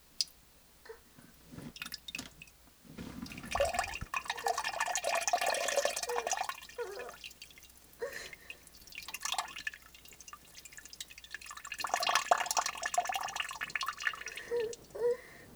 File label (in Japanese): おしっこ トイレ モノラル